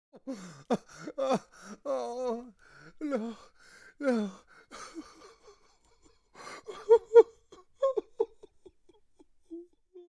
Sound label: Man crying and wailing